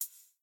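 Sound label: rabbit footstep; smite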